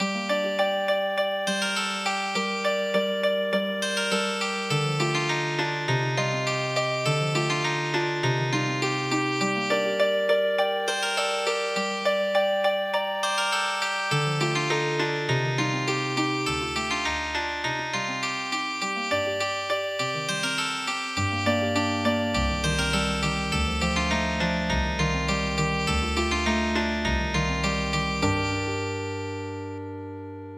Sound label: wild field duck Healing Harp